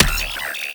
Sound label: Drone Death